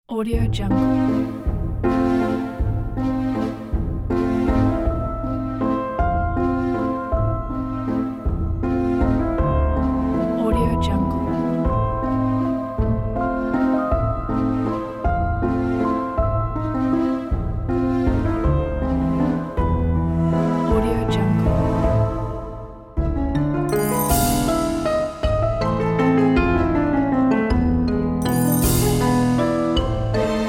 halloween waltz

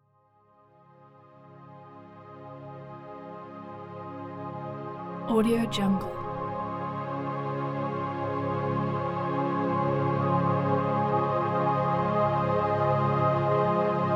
inspiring ambient soundscape

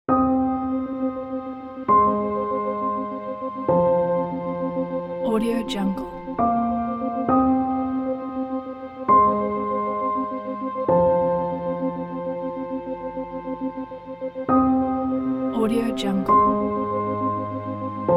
emotional inspiring; bartomusic

